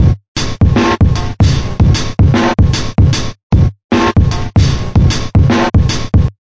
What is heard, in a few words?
76 bpm, crunchy lofi, drum loops and breaks; bugger